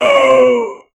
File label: final miserable collapse, zombie